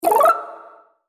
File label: notification